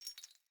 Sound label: Grunt Foley Movement Chains; Sinecure; Melee Grunt